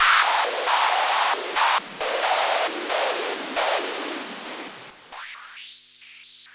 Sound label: Yamaha CS5, Filtered Noise